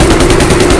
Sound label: Chain Gun Loop; Phoenix